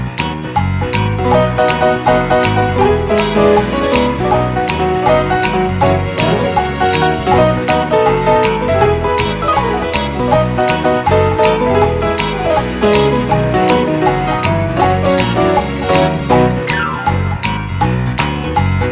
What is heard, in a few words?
A Fast Dance